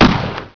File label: gun